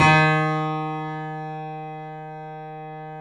Small Pianos, 3-D Audio Collection; E-MU Producer Series Vol. 5; 3D Multis

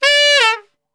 D 3 SAX; Best Service; Brass Super Section

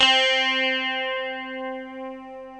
C3 CLEAN

